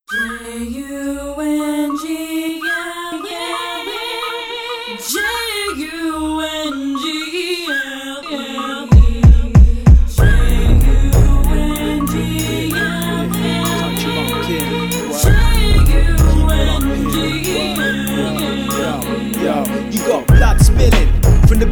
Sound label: jungle